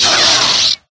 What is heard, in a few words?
clash; sinfar haks